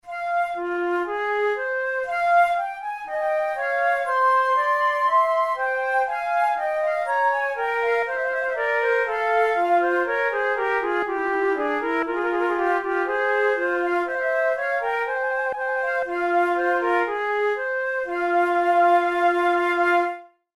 prelude, flute

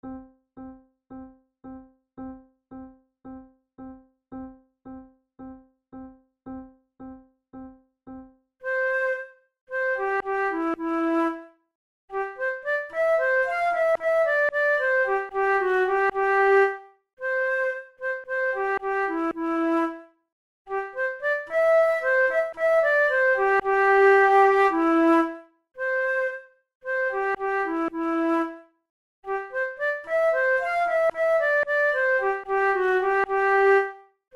horn duos larghetto; mozart

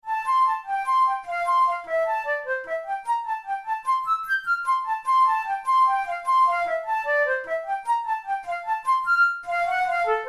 study in f major, flute tunes

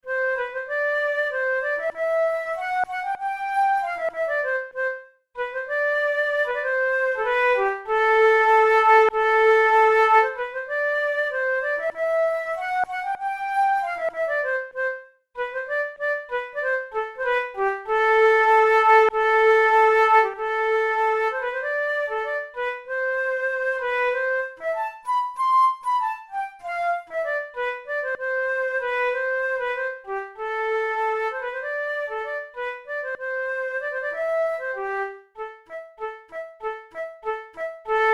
romanian folk dances, flute

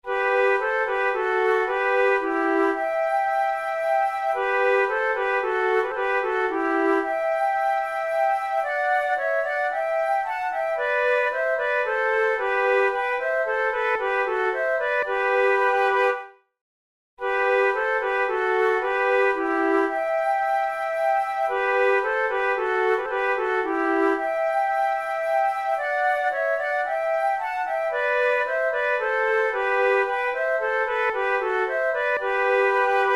duet, flute tunes

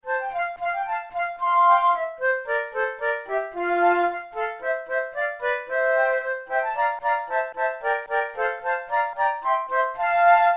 trio, flute; martini

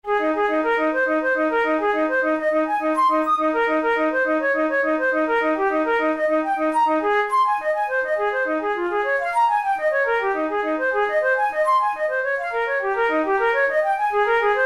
methode pour la flute study; drouet

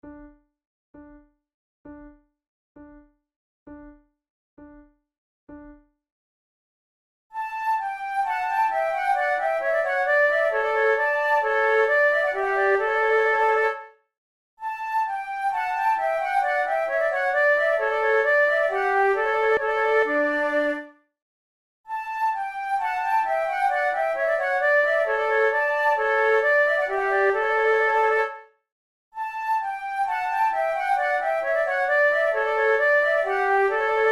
sonate en trio gavotte en rondeau, flute

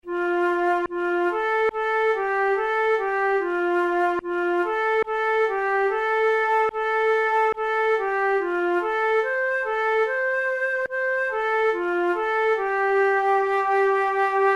a la claire fontaine, flute

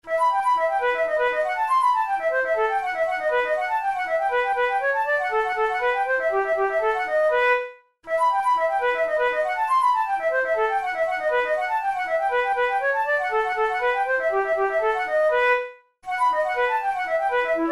aria, flute; braun; pieces sans basse no20

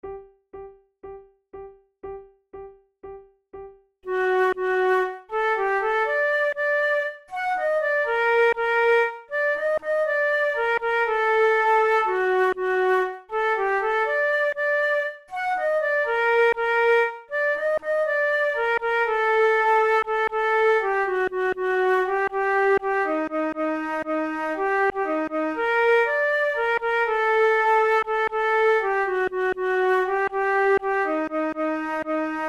duet, flute

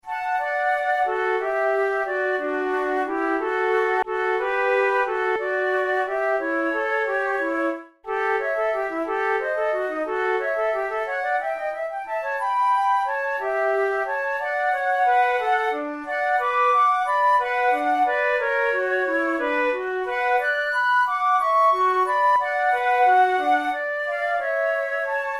duet in d major; kohler op93 no1 duet no1

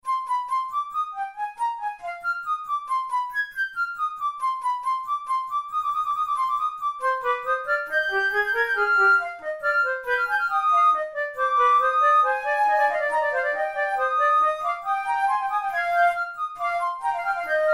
in c minor, flute

